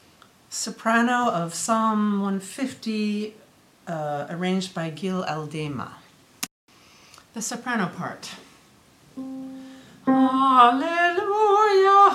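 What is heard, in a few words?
Psalm 150 soprano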